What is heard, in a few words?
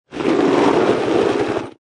Quad Bike Skid On Dirt; Motorbike, motorcycle type Quadbike; Lynne Music